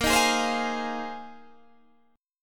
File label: A sharp 7th Suspended 2nd Suspended 4th, down, Guitar, Open D